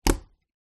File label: crutches on wooden floor single step; foley